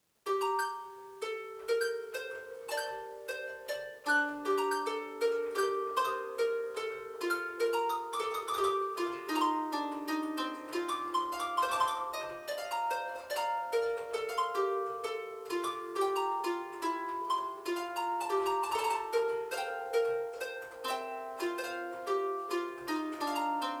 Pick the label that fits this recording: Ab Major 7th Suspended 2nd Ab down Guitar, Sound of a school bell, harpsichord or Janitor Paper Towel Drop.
harpsichord